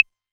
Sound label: Clave, Novation Drumstation; DrumstationV1